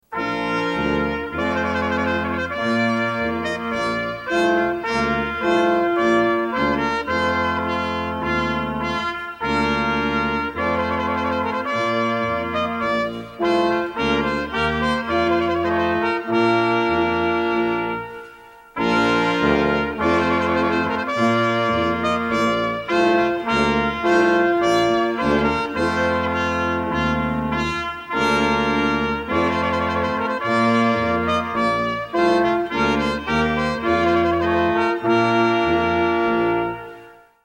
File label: Trumpet Voluntary